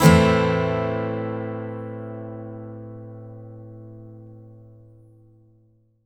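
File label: Acoustic guitar A minor up, Country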